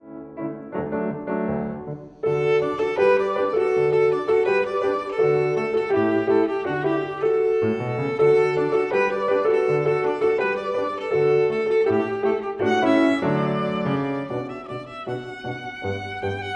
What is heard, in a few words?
VIOLIN